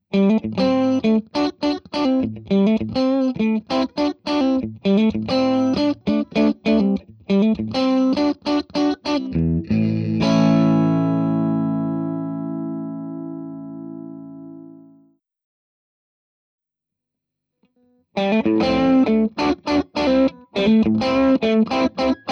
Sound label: tweed re20 boost; randall mts